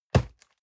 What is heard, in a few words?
box hit hard rustle